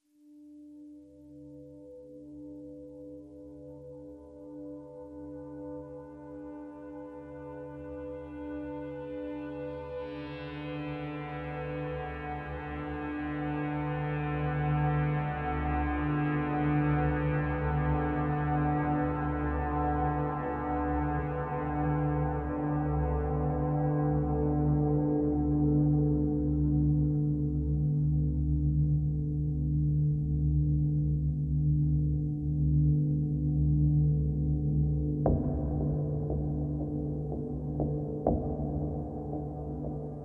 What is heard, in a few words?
Underscore